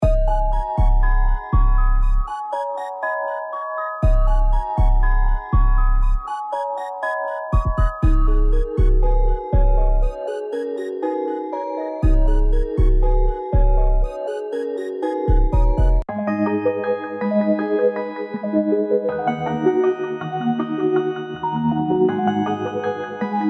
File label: Ride Loops